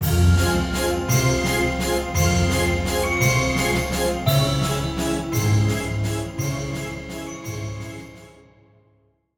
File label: Stinger; Silas Darkmoon